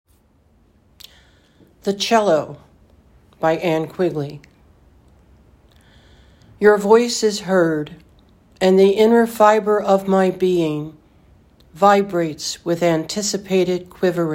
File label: The Cello